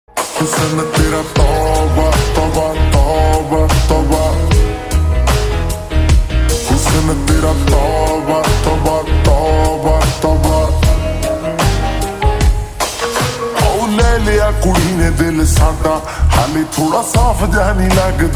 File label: slowed and reverb